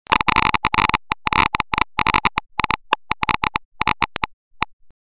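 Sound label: Geiger, ringtone; politono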